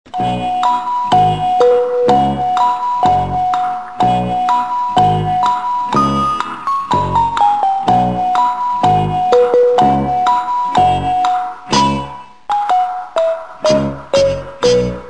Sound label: gameshow